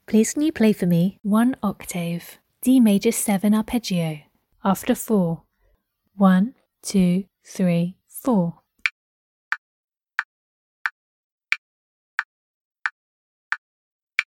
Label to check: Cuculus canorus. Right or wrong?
Wrong